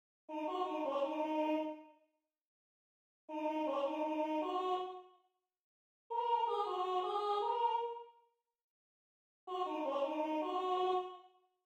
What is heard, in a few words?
ensemble dancers; bars 53 - 61